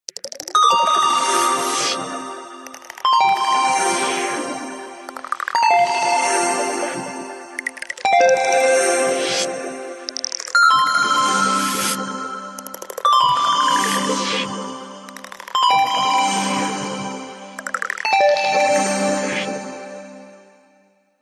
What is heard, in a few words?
Samsung original Ringtone; tonos para teléfonos móviles SAMSUNG